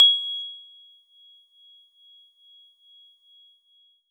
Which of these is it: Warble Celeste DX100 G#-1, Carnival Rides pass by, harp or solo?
Warble Celeste DX100 G#-1